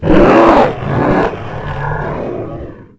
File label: roam, Dragon sounds; Unreal